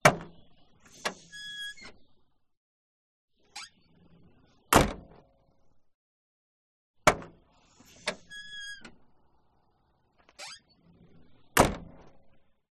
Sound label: AUTOMOBILE S-TYPE Jaguar Trunk Auto Open; 2000; fox audio